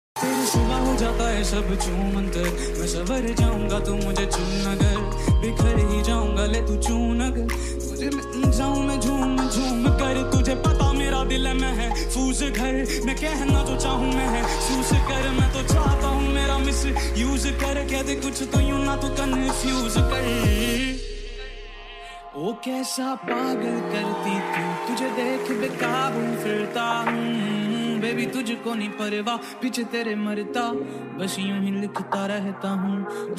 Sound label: Hindi Ringtone